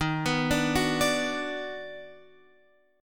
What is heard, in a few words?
D sharp Minor Major 7th Sharp 5th